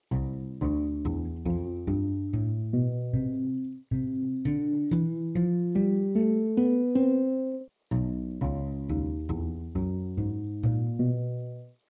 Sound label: Basic Theorbo Sound